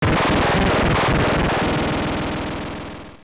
Kill Boss, Dungeon boss killed; AOL

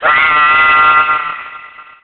Fall; Link falling off a platform

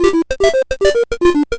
Enemy Parachute; An enemy parachuting; zelda

